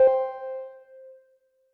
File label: Synth C, Synth Prophet One Shots; Electronisounds